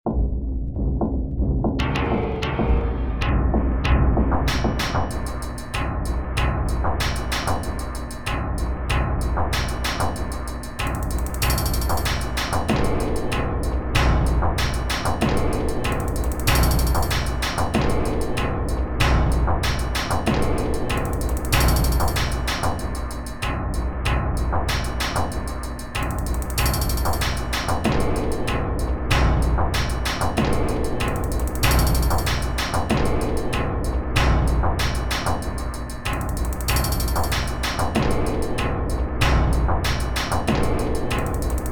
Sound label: klang bang